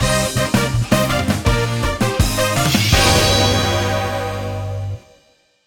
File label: Battle Jingle Normal